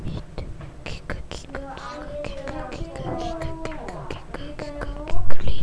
kick

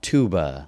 tuba

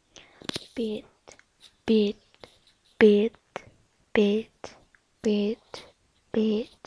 beat

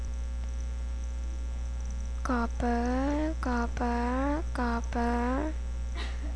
gobble

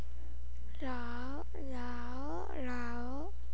laugh

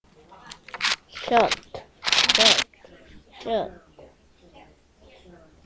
shut

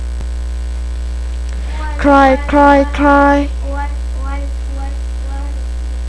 cry

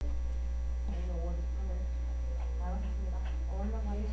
surf

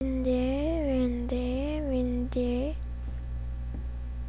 windy

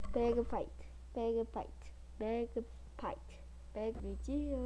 bagpipe

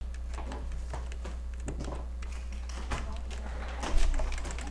cry

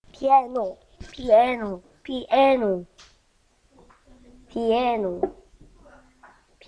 piano

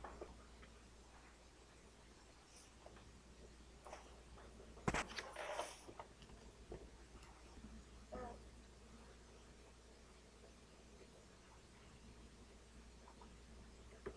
bottle

